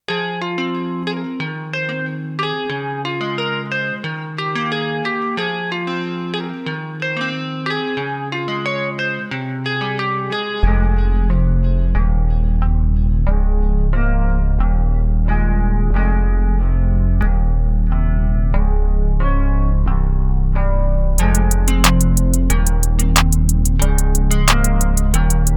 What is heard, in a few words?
beat